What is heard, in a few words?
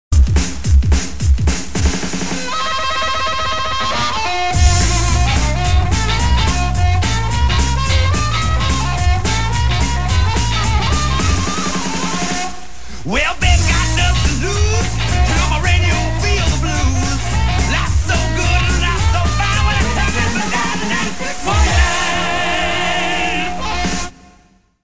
Jingle, radio